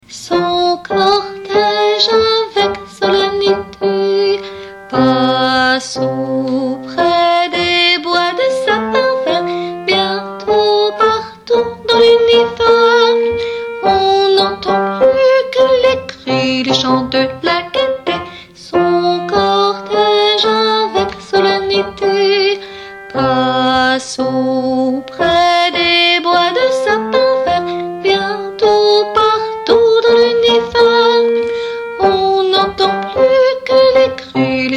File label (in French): fanfare basses